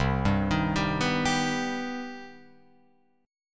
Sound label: C Minor Major 7th down Guitar Open F; arpeggiated; JGuitar